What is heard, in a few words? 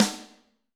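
Snare Wet Piccolo, Alesis D4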